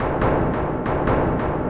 beat; garrysmod

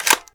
m16a4 bolt pull; garrysmod

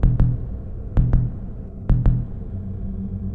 beat; garrysmod

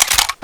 m416 silencer off; garrysmod

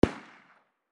ump, distant sound; garrysmod

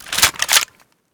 m60 bolt pull; garrysmod

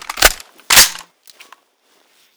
mp5 clip in; garrysmod

